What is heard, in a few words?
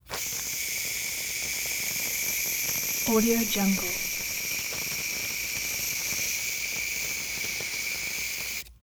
shaving cream